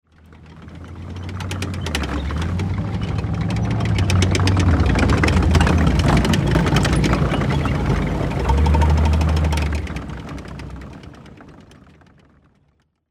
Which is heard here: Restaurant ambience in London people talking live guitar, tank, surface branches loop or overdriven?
tank